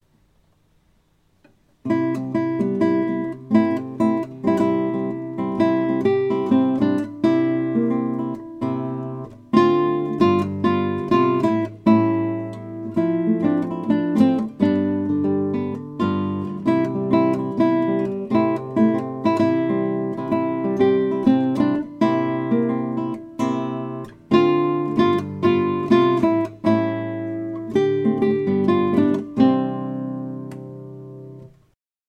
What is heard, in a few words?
Jingle Bells jazz, guitar